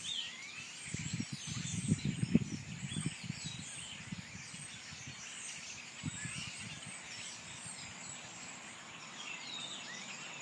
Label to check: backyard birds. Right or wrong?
Right